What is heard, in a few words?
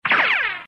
run; disney; mashup